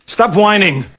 cry; svencoop

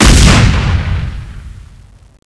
explode, weapons; cstrike

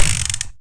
GL Spin

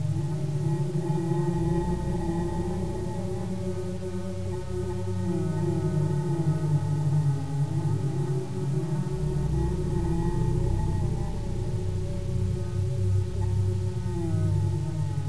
mantra; tfc

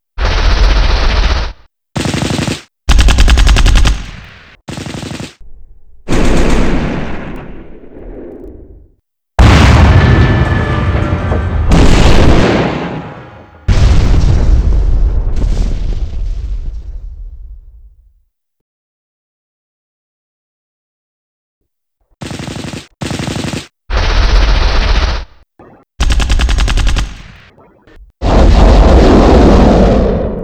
background sound

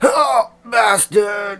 death; wizardwars

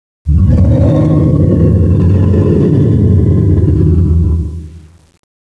roar; renesaur

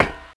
grate; firearms

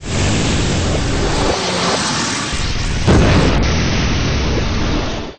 ship

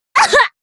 Hurt, voice commands Anime Loli; svencoop

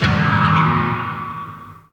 flash; svencoop

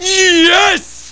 yeeeees

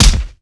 swipe hit; weapons

